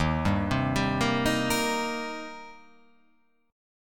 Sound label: D sharp 6th, D sharp, down